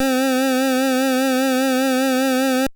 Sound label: vibrato